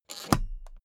suitcase close latch lock fast hard

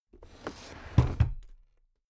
drawer cabinet stuff close heavy

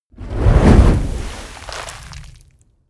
whoosh fire ball crackle medium

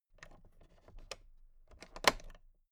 toolbox latch close soft

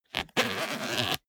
zipper small case close

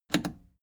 plastic button scratch smooth click enable fast

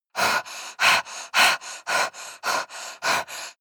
breath female pant

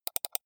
computer mouse left click double soft fast

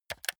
console controller r1 press fast hard

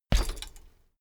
bicycle bike land rattle front

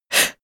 female breath fast in

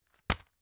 tissue package drop hard

